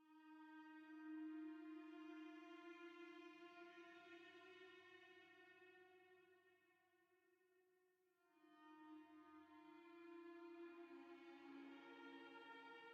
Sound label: cello solo; sky pillar; Tyranny Gold Edition